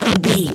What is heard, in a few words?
foley leather stretch couch chair